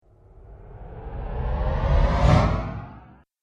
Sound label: Dance Riser; Rise And Dive Sound Effects